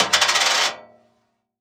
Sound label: Coin On Metal Tray